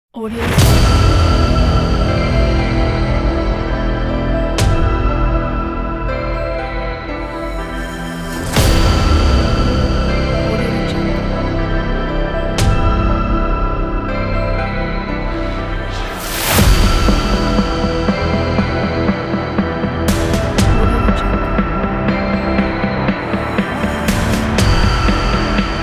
hybrid trailer

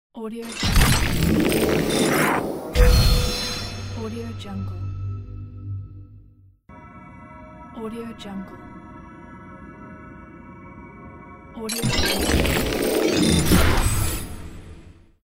teleport magic gate build; jbobstudio

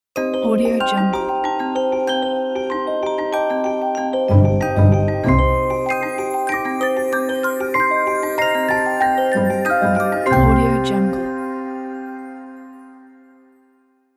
fantasy logo

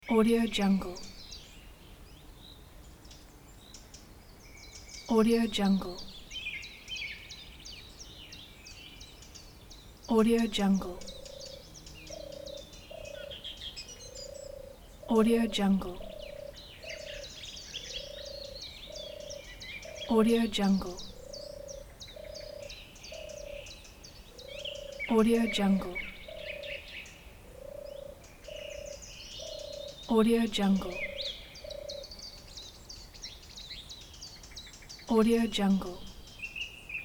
forest insects birds evening

